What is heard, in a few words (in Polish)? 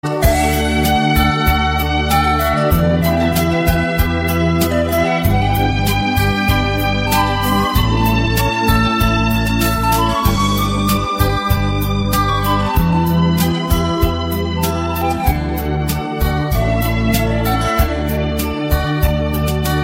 Śliczne Instrumentalne